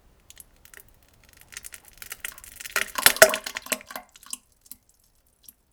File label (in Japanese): やわやわなんべん むちち むちちち ちち （ぼばちゃち ちゃちち）